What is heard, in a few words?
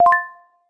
SMS Tones Short